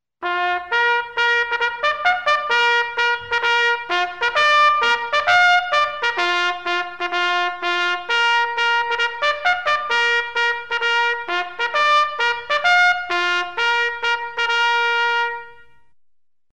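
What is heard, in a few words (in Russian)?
военный горн праздничный салют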